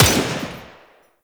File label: Tommy Gun Shot 3D; Warframe; Tenno